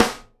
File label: snare GLASS, Live Snares